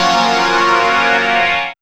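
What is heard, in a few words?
synth chord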